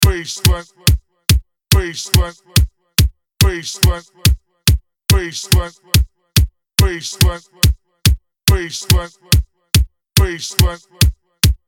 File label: Bassline; Rainerkot; KRAUTDUBS